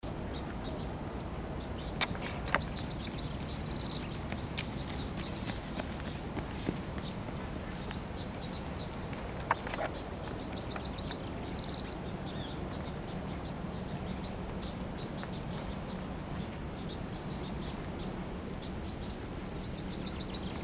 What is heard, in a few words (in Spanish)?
pitiayumí voz